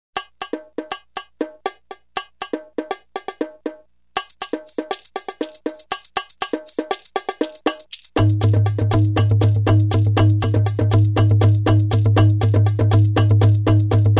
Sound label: Rhythms of Freedom African; Soundzabound Volume 2